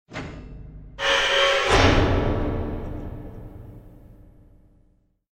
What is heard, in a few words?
Gate Sound